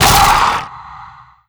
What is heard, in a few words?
vox shockwave yell, zombie revived